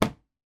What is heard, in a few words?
storage box plastic small lid close; foley